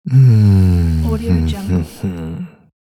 hmm

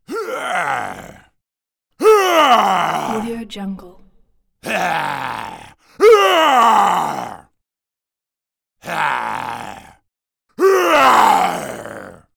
primitive man beast various yells roars anxious; prosoundfx

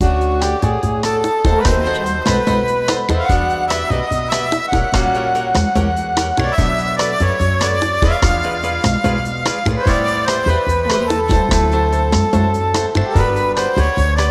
hotel bossa

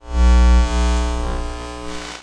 lost transmissions tonal passbys; soundmorph

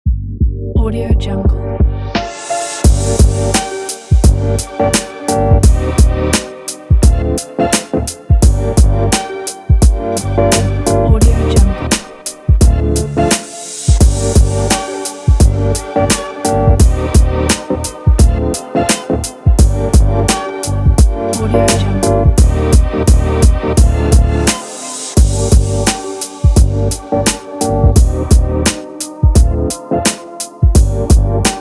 hip hop

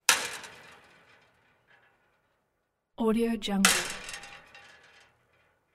metal debris hits

